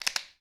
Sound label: vm cock, guns; vm cock clip; vm-68